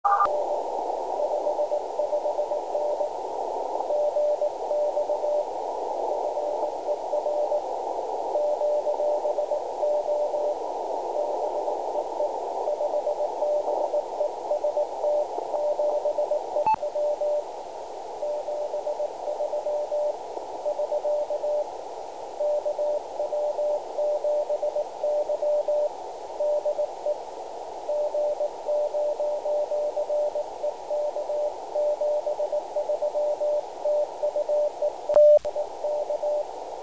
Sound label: 80m cw; g0rek